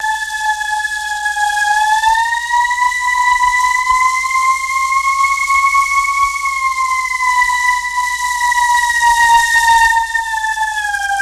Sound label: Motorboat Hi Whine On, engines